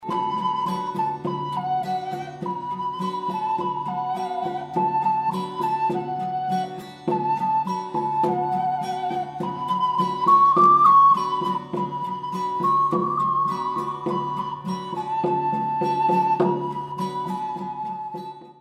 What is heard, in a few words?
ocarina